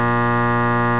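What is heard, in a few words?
TRANSFORMER, MACHINES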